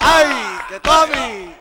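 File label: CLAP + VOCAL, MCLAPS+VOX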